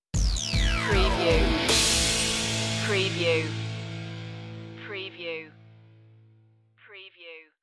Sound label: crash course stinger